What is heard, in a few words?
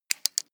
metal rattle lock